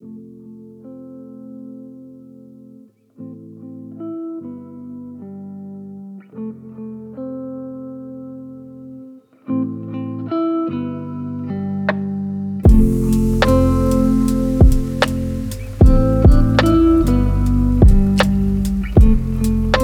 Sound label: lofi hip hop